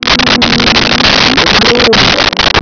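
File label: voice bantha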